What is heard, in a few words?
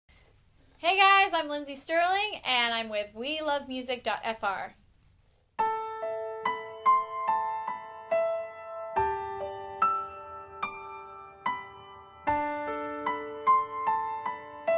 Acoustic Version